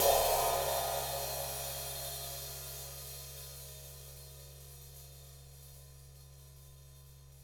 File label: 22 Ride Rivet Br up6; Digital Sound Factory